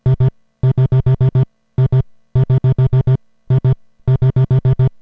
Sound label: synth